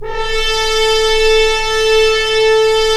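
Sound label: french horns, swells; Roland LCDP06 Brass Sections; F.Horns FX+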